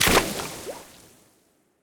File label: Water Enemy Footstep; AudioWizards